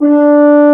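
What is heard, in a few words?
French Horn mf D2; Digital Sound Factory Virtuoso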